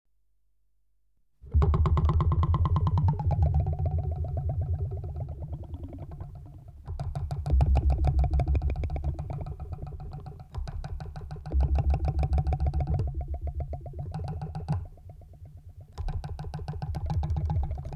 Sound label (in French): rebondissements